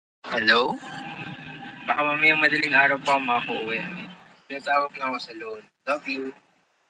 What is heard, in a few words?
phone call